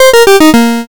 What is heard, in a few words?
gameover